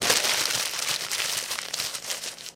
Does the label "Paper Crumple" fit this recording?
Yes